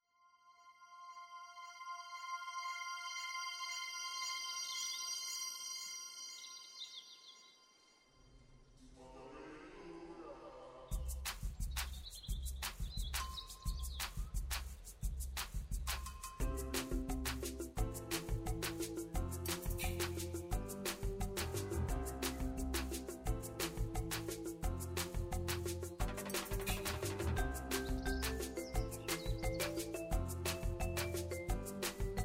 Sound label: bgm intro